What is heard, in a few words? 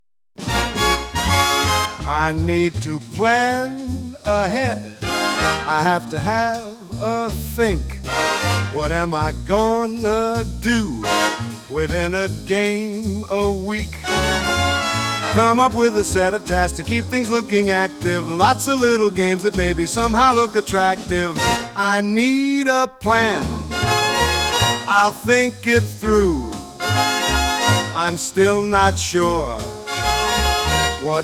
Unplanned Melody (Cover)